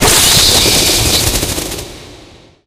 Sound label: spell bat cast; halloween; video games; source engine; tf